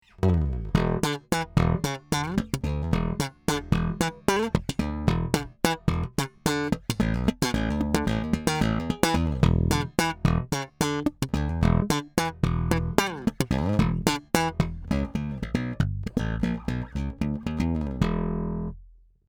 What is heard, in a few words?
SpaceBass passive Slap